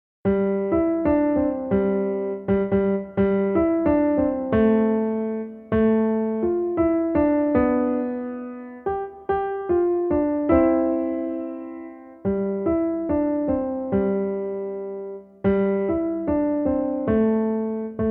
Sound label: Jingle Bells